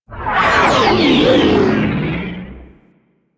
Sentient Ship Attack Maneuver; Warframe; DE; Vehicles; RailJack; RailJackEnemyShips; Space Fighter Snt Coil Agent

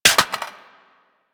Reload Drop Mag Default, Weapons; Warframe; Boar

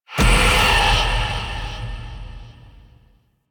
Evolving Weapons Upgrade Success; Zariman

